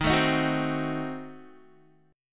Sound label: Eb Diminished 7th Eb, Guitar; Ebdim7 strummed; 11,12,13,11,12,x; JGuitar